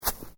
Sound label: Footsteps Boots Snow Shallow Step; Footstep Foley; Human